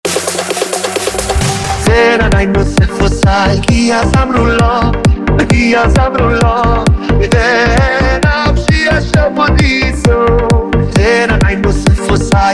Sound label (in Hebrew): פרוגרסיב האוס